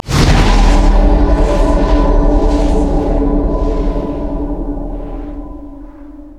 nexus powerup dwarves; LoL

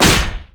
basicattack human; LoL; shyvana

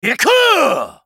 ultimate effort; BlindMonk

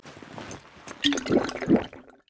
recall leadout; lucian skin01

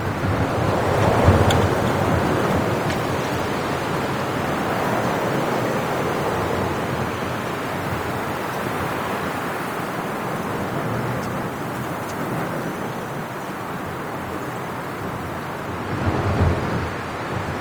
heavy wind loop

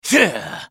spellcast effort; BlindMonk

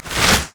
basicattack draw; LoL; varus

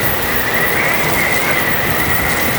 upgrade loop; LoL; heimerdinger

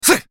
effort; Yasuo; en US

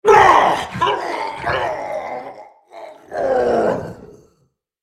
dying; Skarner; en US

